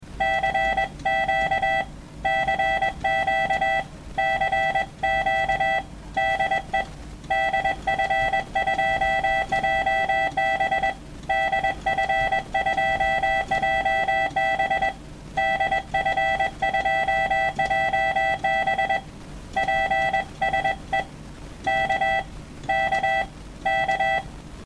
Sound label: cq de, morsen